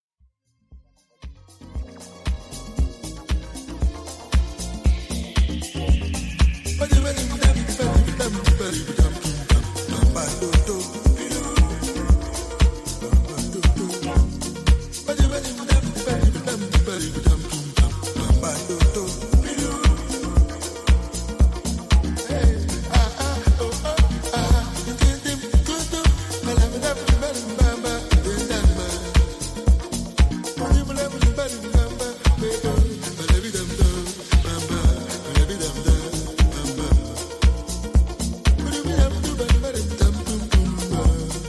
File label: Chilled Out Vibes Mix